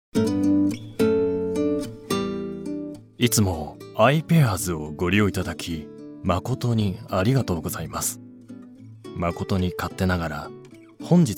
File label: bgm